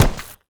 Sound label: muck run